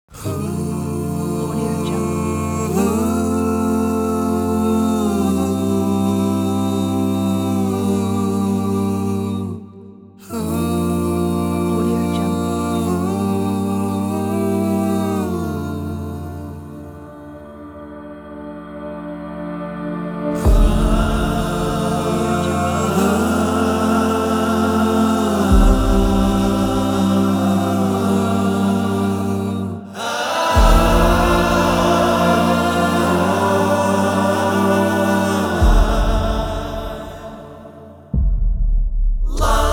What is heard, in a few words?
epic emotional vocals